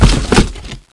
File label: crate move